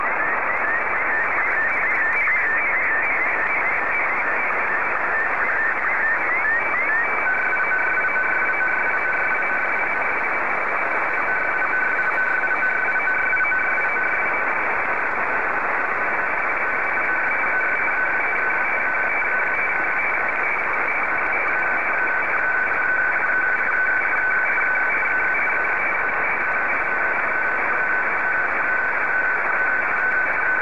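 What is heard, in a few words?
SSTV, Martin 1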